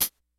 HATS CYMBALS; DRUMS; Kid Nepro; Elite

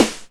Snare, Alesis DM5; Drums

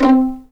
C4 PIZ MF, string; East West; The Ultimate String Collection

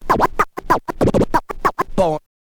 scratch kit, vinyl

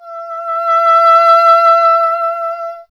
E. Horn

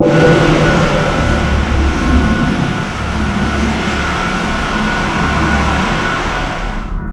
AIRPORT, ATMOS; USB Soundscan vol.33; AKAI; Disjointed Textures

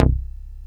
DASH C1, BASSES

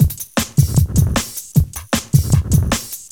LOOP, Eurotech; Sounds Good

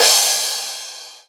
Crash, Cymbals

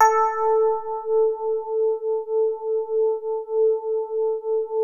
DX7 MID2 A4, E-PIANO; Music Store

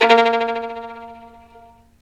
STR Violin, Solo Violin FX

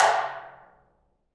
Af. Hand Drm, Ambient

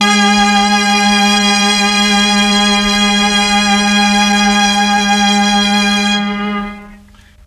MEL STR, Legendary M400

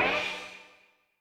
CLOUDS, Effect; Yamaha DTXpress

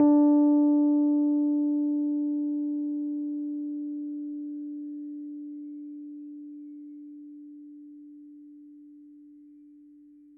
D4, Rhodes Mark II; mkII 88 key LO; CEPCo Sample Series Vol. 3

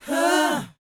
BOYZ, Vocal, R&B Dance

